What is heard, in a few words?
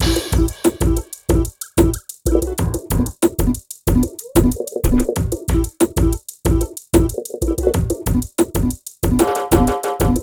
BEAT, Beats 93-96bpm